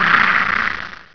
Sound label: death; alienarena; grey